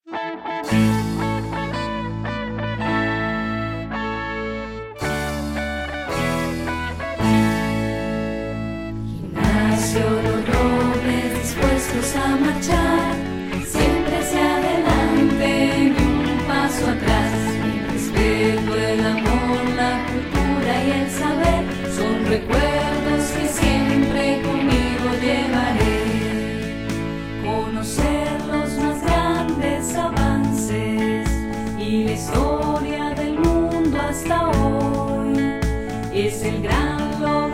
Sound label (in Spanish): himno